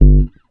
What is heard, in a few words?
HEY HEY BASS; Sampleheads - Ultra Freakin' Bass